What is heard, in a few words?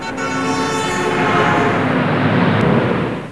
street